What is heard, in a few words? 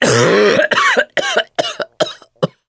cough, mechanic; voice